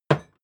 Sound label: hammer wood hard